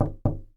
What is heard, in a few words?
door wood knock